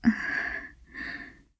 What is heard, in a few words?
exhausted sigh; moeka